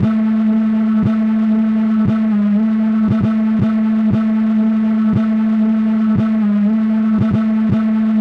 rave pop industrial like electro string synth 117 bpm